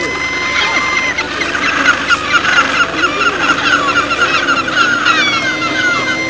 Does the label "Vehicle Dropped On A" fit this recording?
No